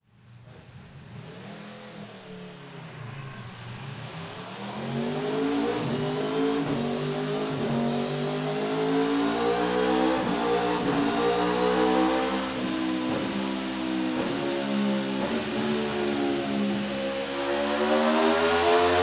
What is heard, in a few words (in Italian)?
RSV4 Partenza Guida Veloce